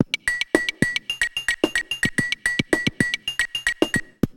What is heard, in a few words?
Bent Korg MR-16 (110BPM), cyborg beats; musicradar